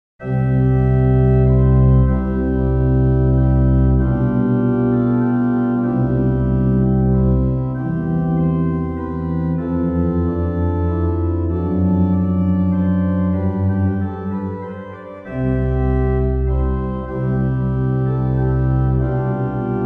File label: Andante sostenuto, organ; Livre Souvigny